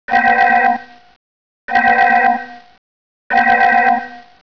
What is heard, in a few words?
red alert